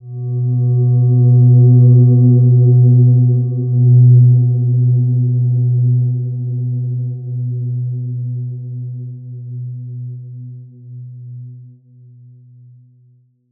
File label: Silver Gem B2, healing soundscapes